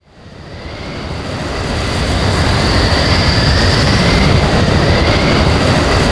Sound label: Twister; A twister approaching the ship (final 77,810 bytes loop); Zelda